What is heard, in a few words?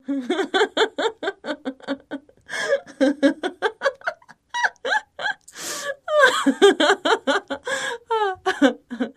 Laughter Female, female